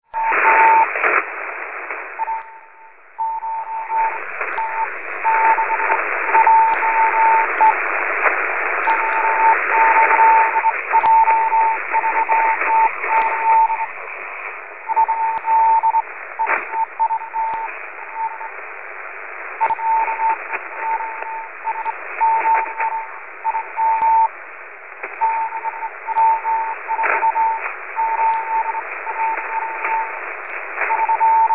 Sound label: morse